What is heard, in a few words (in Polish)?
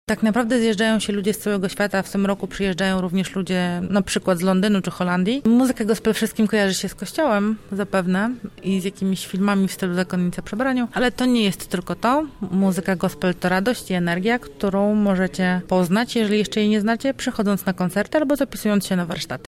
Wiosna Gospel; muzyki gospel; Radio Centrum